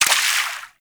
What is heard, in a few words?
Water Sprayer Surface Impact; Warframe; Weapons